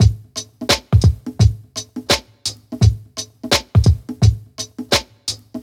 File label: 85 bpm A groovy drum beat; drum loop